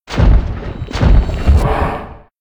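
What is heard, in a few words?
giant elk spawn, monster; Senillinea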